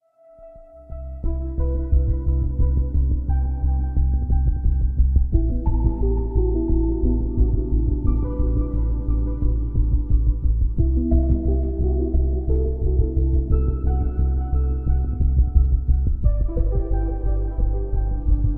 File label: background music; Opera GX; Evolve